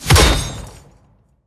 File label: Lockbreak; Level16 Demonboss